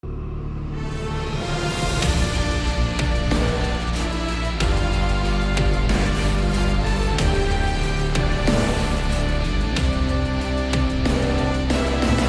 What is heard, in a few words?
oppo ringtone; pubg